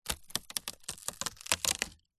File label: wood split crack break splinter